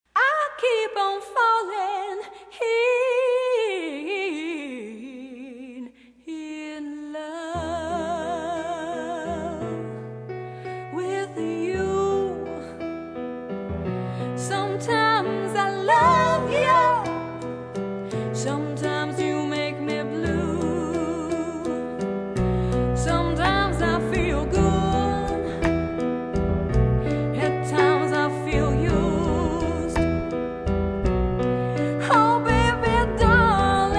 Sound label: vocals